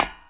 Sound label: step; KINGPIN; player; metal light